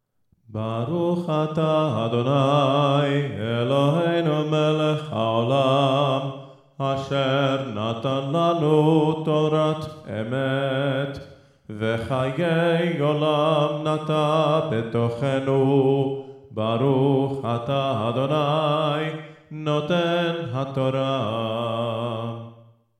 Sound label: torah blessing after reading